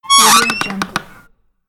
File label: door creak